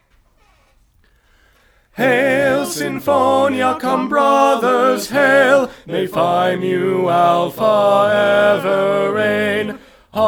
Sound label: Hail Sinfonia Bari loud